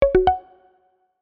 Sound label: rep complete